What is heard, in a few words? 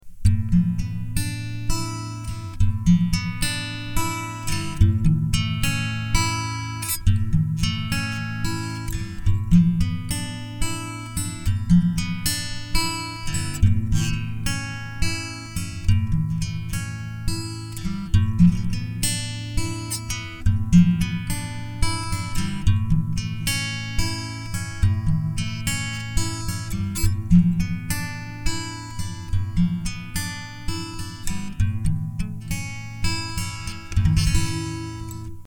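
guitar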